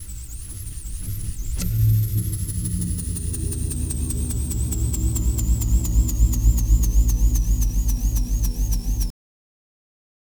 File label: industrial balloon spins, Robotic Lifeforms; SoundMorph